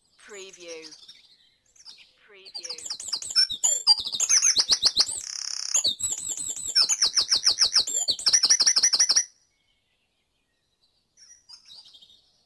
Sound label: Dawn Chorus in Mold North Wales - Short Loop